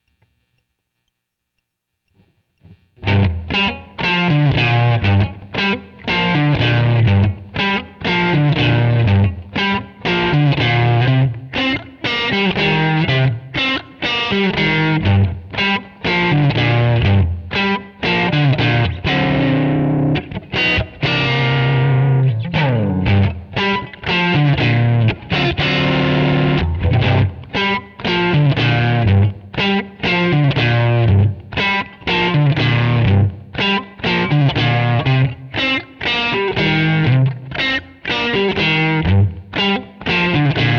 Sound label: Austin Stomp; admiralbob77